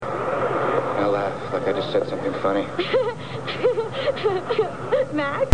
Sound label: laugh; roswell; max & liz; end of the world